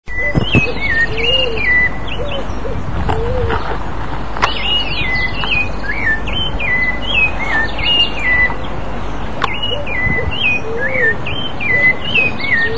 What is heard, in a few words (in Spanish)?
voz zorzal colorado